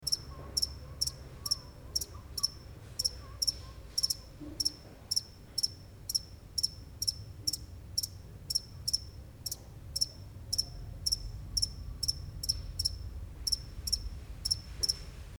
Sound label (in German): Grille